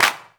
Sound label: Clap; Vengeance Samples